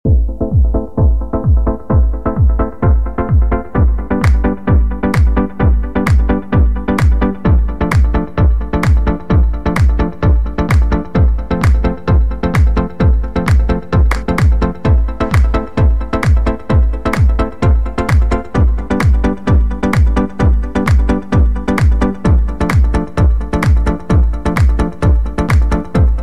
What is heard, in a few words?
cool summer background music tone ringtone